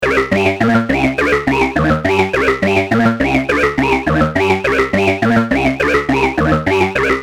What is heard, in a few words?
vocal; synthmodules